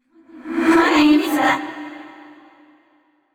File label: laugh; yamiko